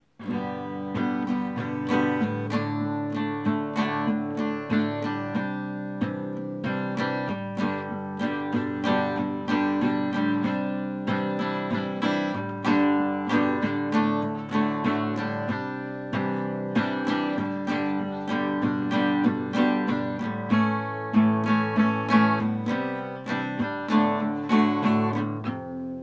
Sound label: music acoustic; sarahs restaurant